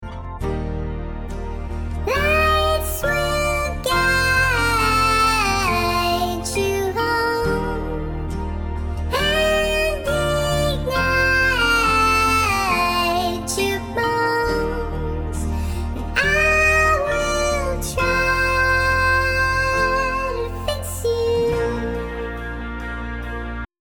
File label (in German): B Dur Sopran nur Chorus; cantaarte advents und weihnachtslieder 2025